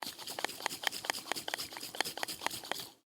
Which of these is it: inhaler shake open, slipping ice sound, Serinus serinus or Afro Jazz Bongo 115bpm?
inhaler shake open